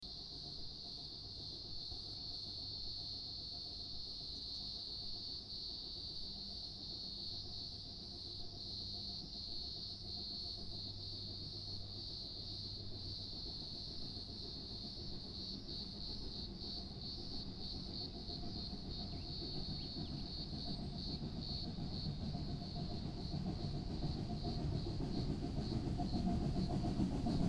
Australian Bush Locomotive

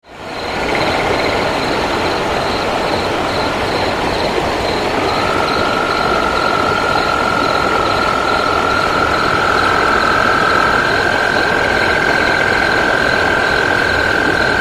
Kolob Canyon Nightscape, Utah

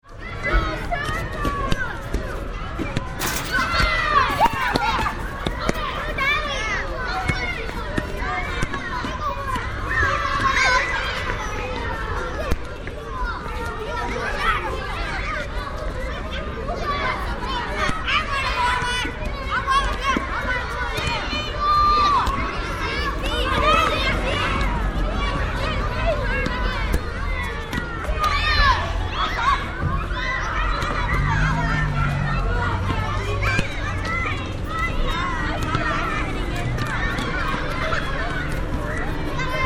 Playground

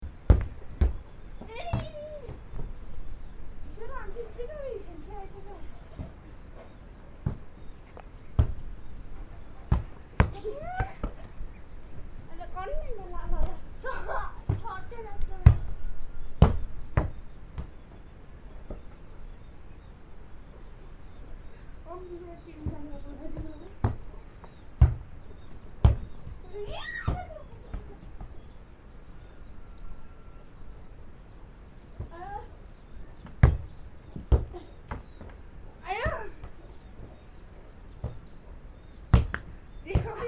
volleyball, nepal

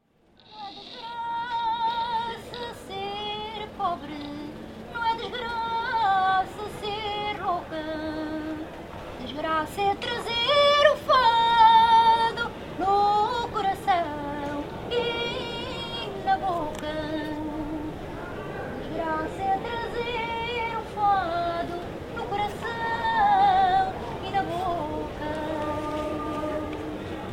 Lisbon Alfama Fado

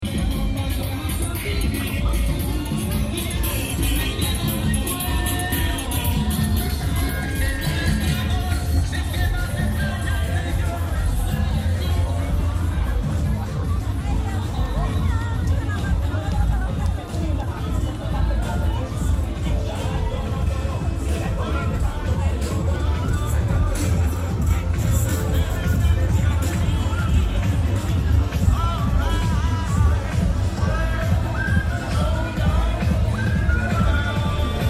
David Panama Country Fair

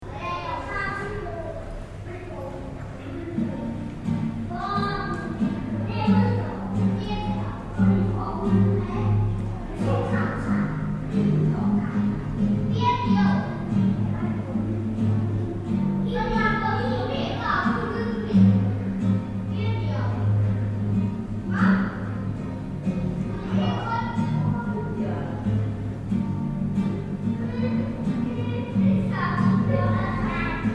hotel noodling, laos

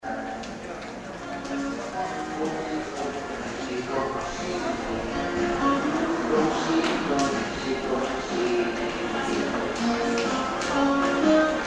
singapore busker

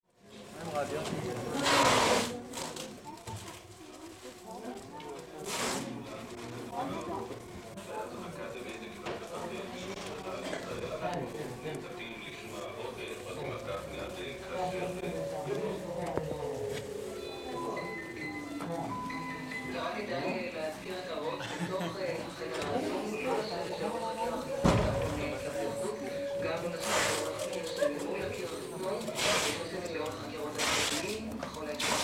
israel air raid

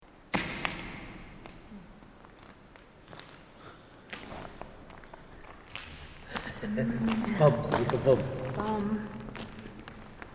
Pathet Lao cave

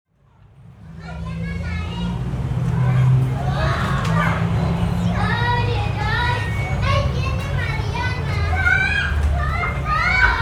Cancun kinder

